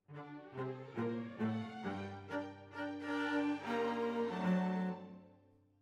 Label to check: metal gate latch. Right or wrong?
Wrong